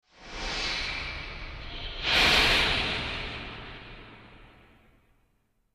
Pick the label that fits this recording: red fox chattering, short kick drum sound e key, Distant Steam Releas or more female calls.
Distant Steam Releas